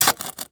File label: Industry Tool Shovel Small Metal Shovel Stabbing Dirt